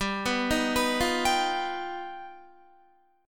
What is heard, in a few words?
G Augmented 7th, Guitar; G+7 arpeggiated